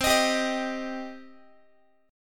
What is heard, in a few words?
C Minor C down Guitar; strummed; JGuitar